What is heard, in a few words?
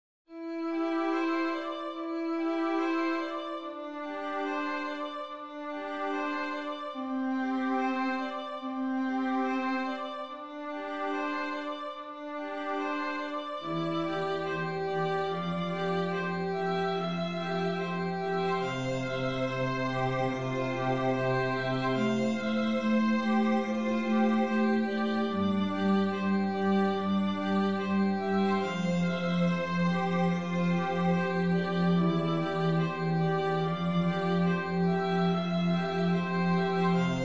string trio